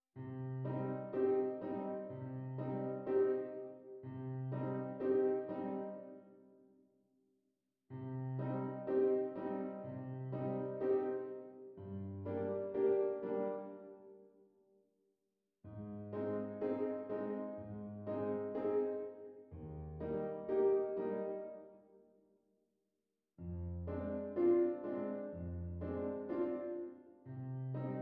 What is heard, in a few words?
piano